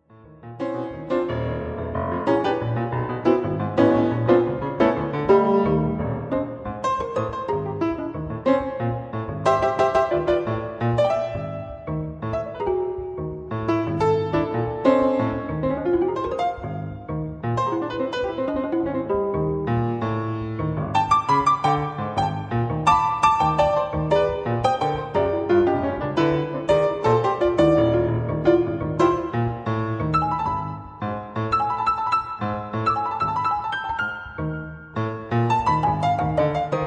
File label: prepared piano; ピアノ・インプロビゼーション